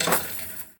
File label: Street Ball Chain Swish; SFX Kit